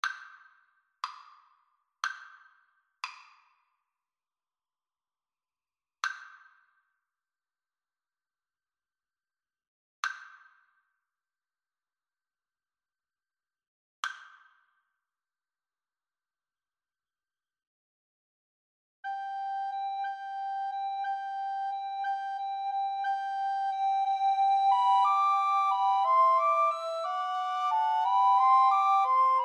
Recorder Trio

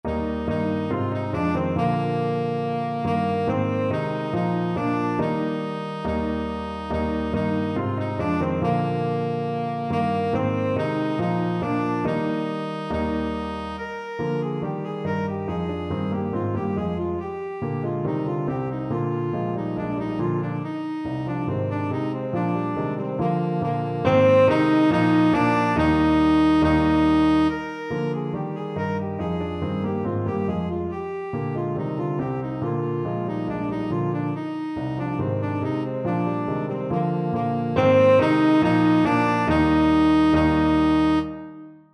tenor saxophone

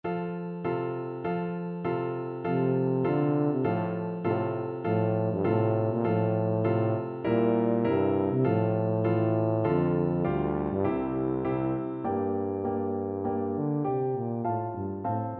carmen, tuba

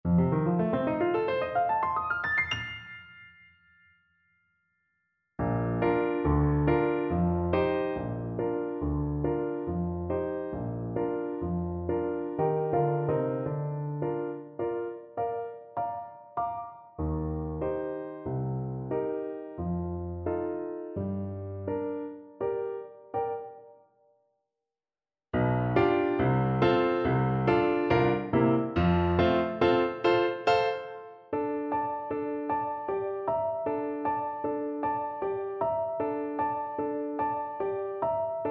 tra voi belle, Flute

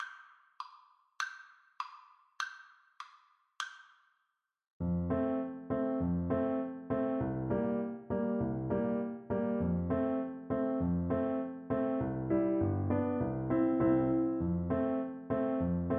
horn, French Horn, hornpipe

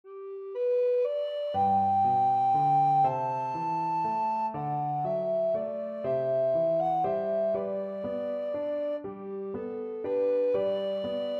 morning has broken, alto recorder

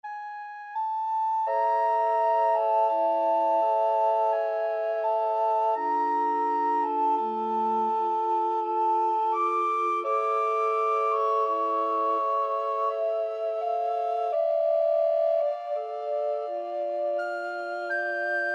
recorder ensemble

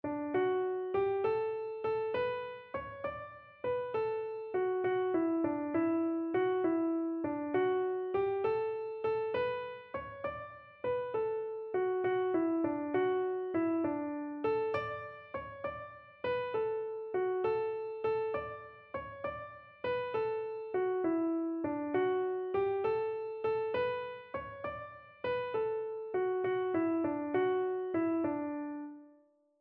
sweet hour of prayer, keyboard

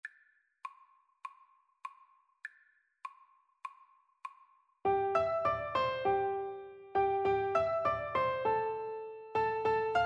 jingle bells, Piano Duet

